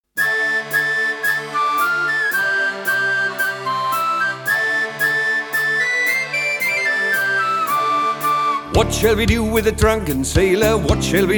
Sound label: What Shall We Do With The Drunken Sailor To play along